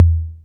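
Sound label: R8 percussion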